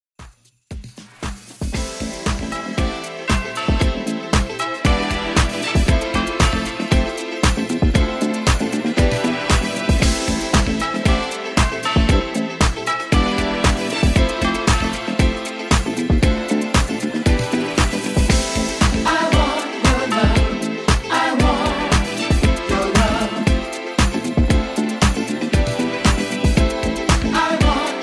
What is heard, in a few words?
70s Funk Redrum 116Bpm